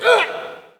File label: Hockey Male Hurt; SFX Kit; Hockey Vocal Sounds